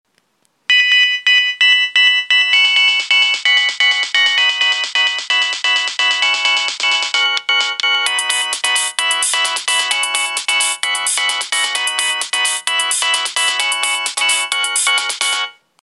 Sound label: Motorola Jubilation, ringtone